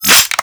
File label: Energy Rifle Reload; Warframe